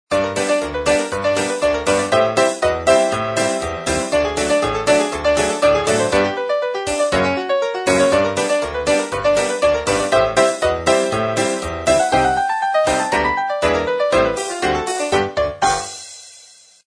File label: Cartoon Chase; Samsung SCH-i539; Basic